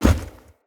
Heavy Grunt Boot Walk Default, Footsteps; Sinecure

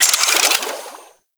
Water Sprayer Alt Pump Load; Warframe; Weapons

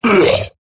Pain Eximus Grineer Male; Battle Barks

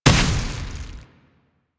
Updated Shot; Warframe; Moa Pet; Cryotra

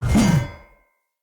Two Handed Mace Whoosh Heavy B; Weapons; Enemy; Blunt; Sinecure Two Handed Mace

